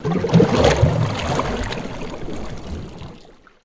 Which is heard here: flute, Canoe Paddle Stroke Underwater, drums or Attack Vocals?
Canoe Paddle Stroke Underwater